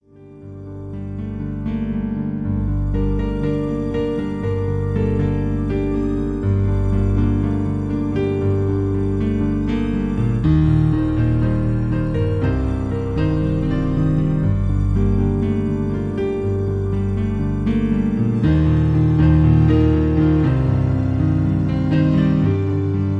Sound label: Piano Version